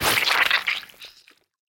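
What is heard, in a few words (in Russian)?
zhutkij nepriyatnij zvuk vihoda rvotnih mass, vomiting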